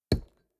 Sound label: lumber tree